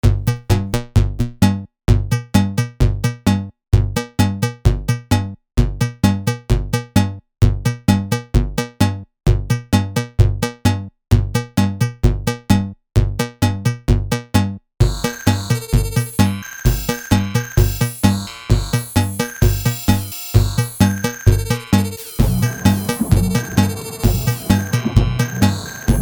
Toy Robot Waltz

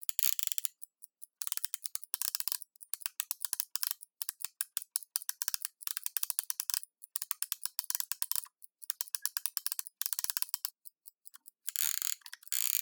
Antique Pocket Watch Winding Mechanism; CLOCKMech; Stereo; Justsoundeffects; Clocks and Mechanics

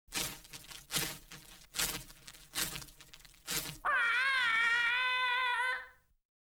Space Fantasy, Disintigrator; Disintigrator Mach; BBC Sound Effects Library

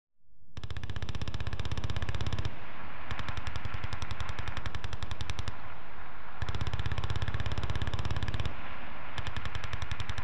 Warfare, General Purpose Machine Gun; BBC Sound Effects Library; Explosions Guns Alarms

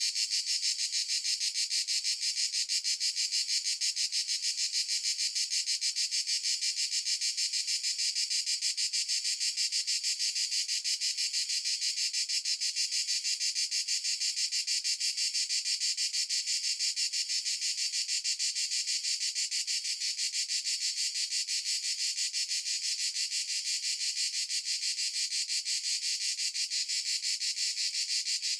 Single Cicada Noon Countryside Italy; justsoundeffects; Crickets and Cicadas

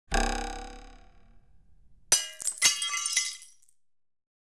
Bull's Eye Glass Smash; BBC Sound Effects Library

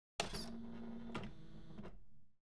Computers, Telex AND Typewrite; BBC Sound Effects Library

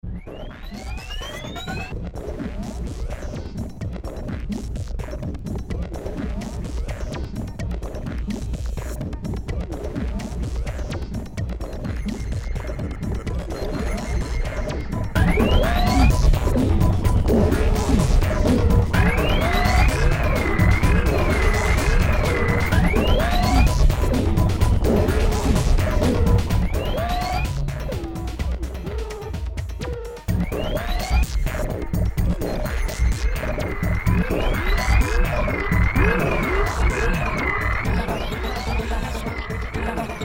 Analogue Brainworm, Trance

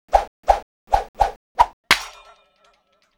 Spin Hit, Hong Kong Action Kit; NoizBoy; Hit Kit 2

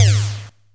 laser Large; Kenney's Sound Pack; Sci-Fi Sounds

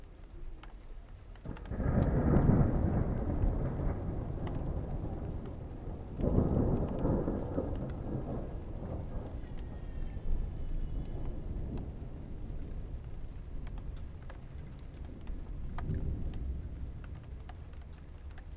distant thunder; 99Sounds; Rain And Thunder

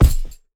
Punching Percussion, Bag Drop, raw; 99Sounds

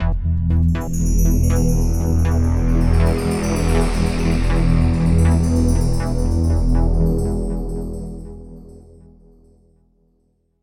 Signature Sound; FXHome; Signature Tracks

